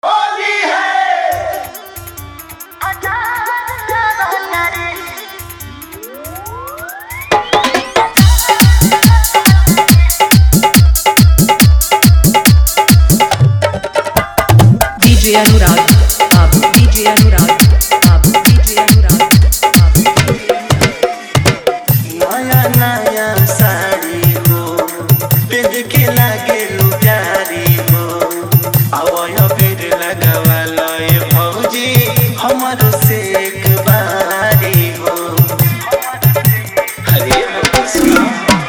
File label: Holi Dhollki Bass Mix